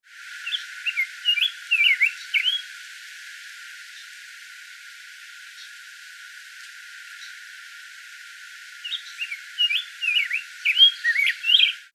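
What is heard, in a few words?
Rose-breasted Grosbeak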